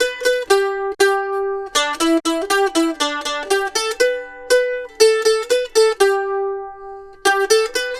Mandolin Lead; Continental Drift Aftershocks

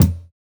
Kick, jungle breaks drum sounds

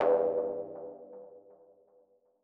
Mono DBeat E2; KG MegaSaur

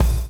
BLOW; Spectrasonics - Backbeat

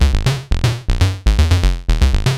SH101 LOOPS; ELEC-LOOPS; Syntec; Wall of Sounds; TECHNO-BOX

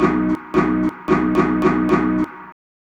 Lounge Breakz, 111

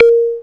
A#, Synclav FM PERCUSSION; Big Fish Audio

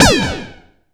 fx-percussion, Techno; Techno ID; single-drums

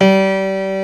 STENWAY; East West; Global Sounds

